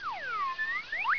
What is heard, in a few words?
slide; 12000 Graphics Pack - A Complete Graphics Collection For Your PC